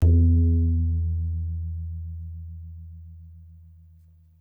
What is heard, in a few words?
tabla; bahia; Dirt-Samples